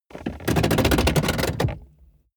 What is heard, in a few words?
car bmw e46 interior seat push back completely rattle